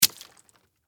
Impact Wet Hit, Small, Object, Drop; Miscellaneous Impact; BlastWave

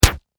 Impact Punch Face Meaty Flesh; Punches and Hits; Combat

